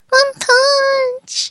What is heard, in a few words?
punch; one punch man